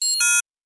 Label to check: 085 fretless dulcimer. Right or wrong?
Wrong